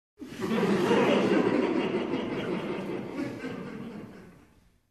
Crowd in an enclosure laughing

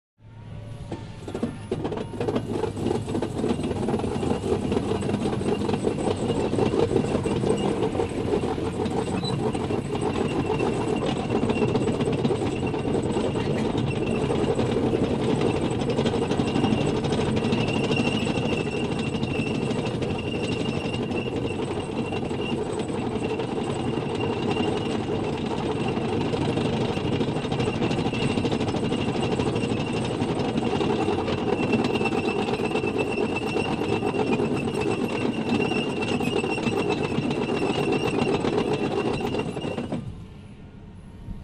Container Rolling on floor rattling

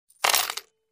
Coins put in coin box

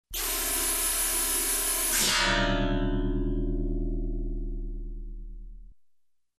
Sci-fi laser welding sound

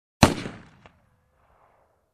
Handgun shot

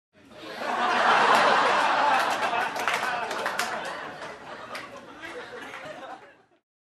Audience in a room laughs and claps

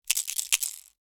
Pill box quick shake

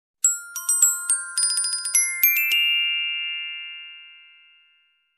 Happy glockenspiel melody

